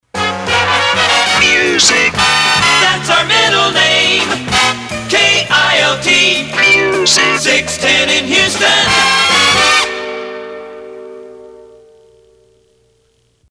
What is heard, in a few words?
Middle Name Promo; KILT; PAMS